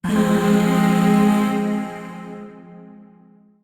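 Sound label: Void Shawzin Minor Chord; Warframe; Zariman; Chords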